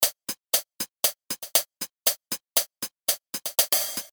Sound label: Live Hats